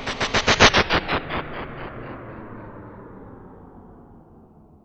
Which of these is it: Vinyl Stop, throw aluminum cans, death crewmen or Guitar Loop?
Vinyl Stop